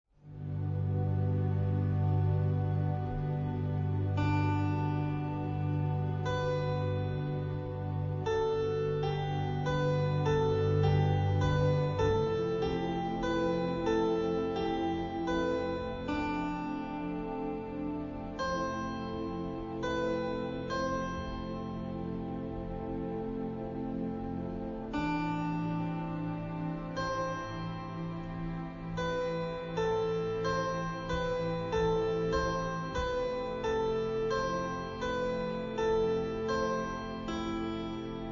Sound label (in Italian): Suoni Per Un Clavicembalo